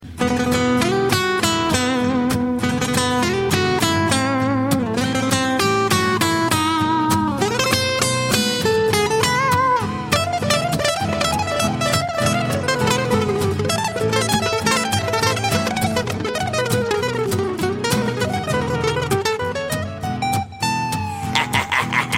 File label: Guitar